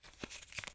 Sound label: grenade smoke russian disarm; Escape from Tarkov